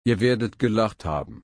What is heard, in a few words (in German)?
lachen